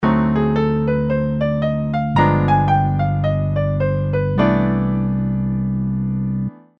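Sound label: diminished scale dominant, piano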